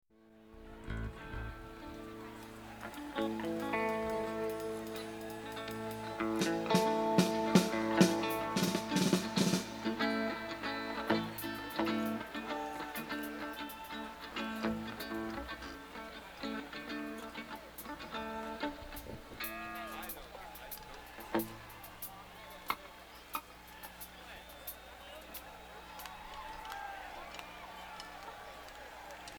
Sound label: Tuning